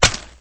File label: splat